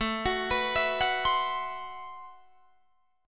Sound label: A Minor 6th Add 9th, Guitar Open D